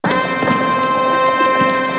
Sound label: ATMO SYNTH; AKAI S6000 CD-ROM - Volume 1; SAMPLE WAVE MIXING 2; GALAXY